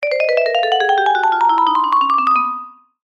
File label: cartoon ascend vibes